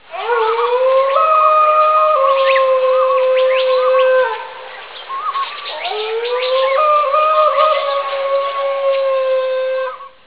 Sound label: dingo